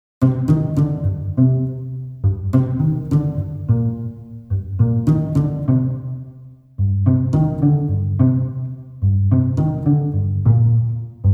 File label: CELLO G